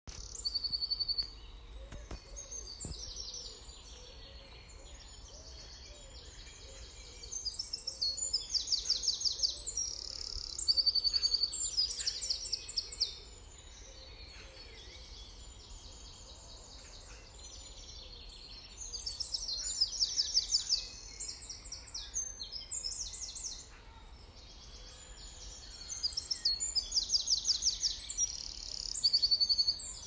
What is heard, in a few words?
Dawn chorus